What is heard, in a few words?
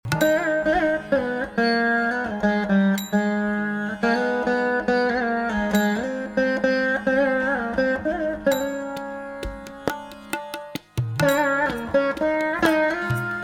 Slow melody, ringtones